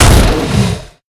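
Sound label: key damage up, monster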